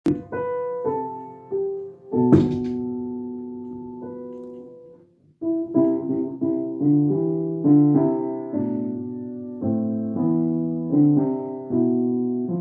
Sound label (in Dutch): improvisaties pedaal